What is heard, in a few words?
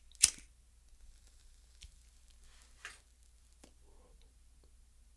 Lighting Cigarette